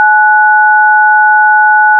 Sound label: dtmf 9